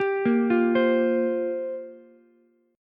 G Minor 11th G down Ukulele C6; Gm11 arpeggiated; JGuitar

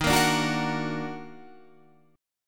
D sharp Minor Major 7th; strummed

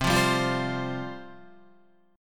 C Suspended 4th C, Guitar; Csus4 strummed; JGuitar

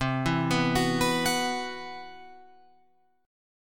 C-Major Sharp 11th, Guitar Open F; CM#11 arpeggiated; JGuitar

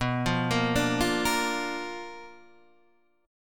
B Minor Major 7th Flat 5th, chord; BmM7b5 Chord {7 8 8 7 6 6} arpeggiated

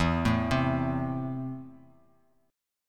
E Suspended 4th Sharp 5th E, down, Bass Standard; arpeggiated; JGuitar

